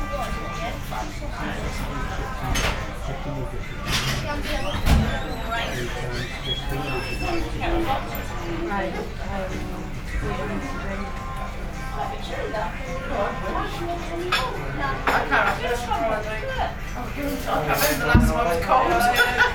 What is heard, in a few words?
cafe busy loud; Weymouth